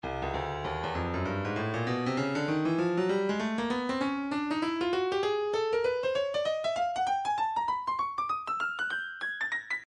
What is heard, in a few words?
stylus breakbeat midi 098 bpm; Bugg Boy